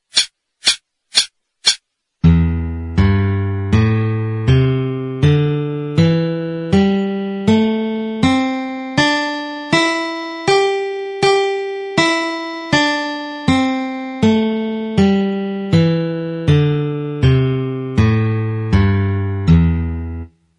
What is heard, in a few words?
A Minor Pentatonic 80, guitar